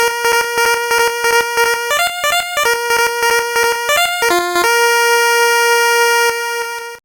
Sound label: Ditty; NBA in the Zone 1999; gbc